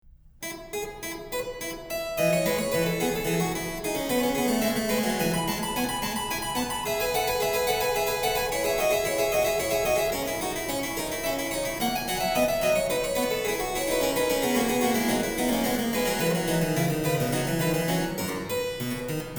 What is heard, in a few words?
Invention Harpsichord; Bach